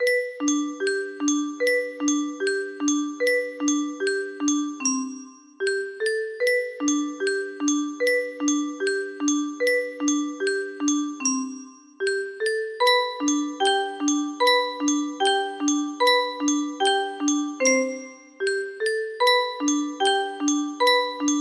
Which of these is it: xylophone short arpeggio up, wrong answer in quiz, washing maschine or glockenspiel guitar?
glockenspiel guitar